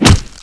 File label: bullet hit; weapons; svencoop; echoes